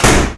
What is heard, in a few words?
DOOR; AKAI S-Series CD-ROM Sound Library VOL-8; SFX